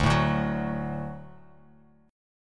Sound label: D Major D down Bass Standard; D strummed; JGuitar